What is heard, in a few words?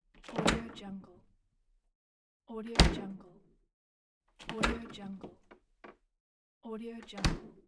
fridge door